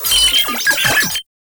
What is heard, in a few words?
Sniper Drone Vocal; Lasrian Drones